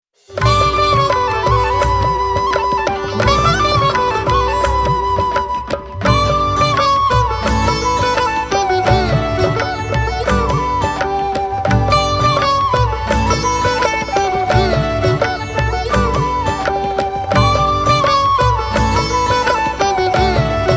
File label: Ringtone; Jai Ganaraya